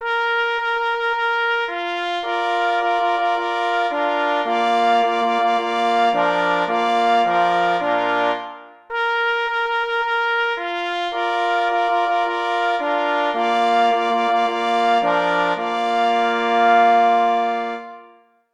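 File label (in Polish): Fanfara dekoracyjna na zespół 2 plesy i 2 parforsy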